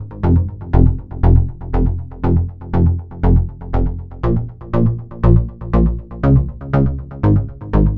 NRG Bass, Trance

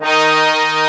BRASS 2 D3 -L; AKAI S6000 CD-ROM - Ultimate

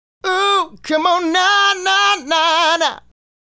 Male Solo, Hip-Hop and RnB Vocals

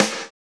NICE SD; DR.ACCESSOR

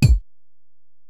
Ac Perc, Drum Machines Alesis DM-PRO

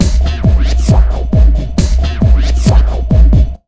Big Beat Drumloops, 135

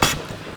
AIR, Anime Sound FX, ROBOTS

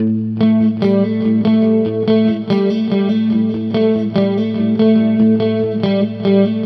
guitar, stringed instruments

